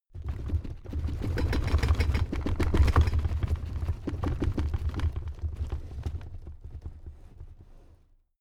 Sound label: drawer cabinet crammed rattle